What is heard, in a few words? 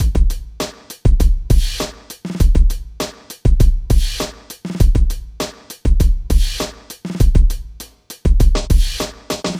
Drums; Hip Hop Philosophy; big fish audio; Apple Loops; 100 Emin